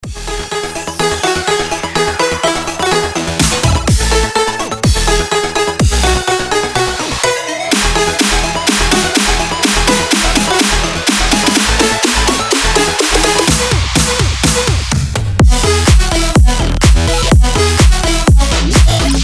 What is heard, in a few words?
jingle bells electro